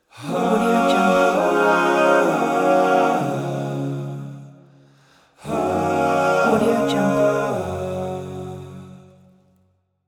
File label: epic vocal logo